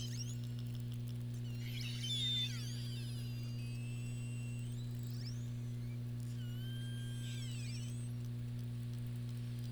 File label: Globicephala melas